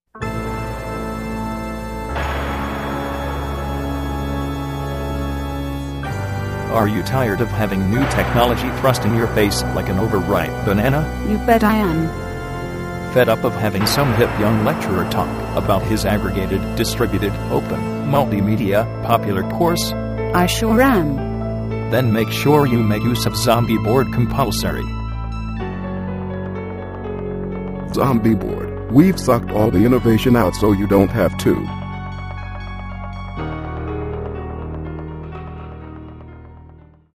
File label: zombie